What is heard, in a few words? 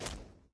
kick, metal; hl2; impact physics